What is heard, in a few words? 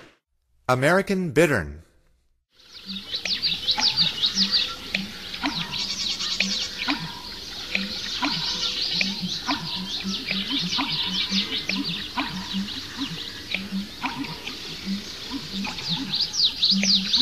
American Bittern; North America; Stokes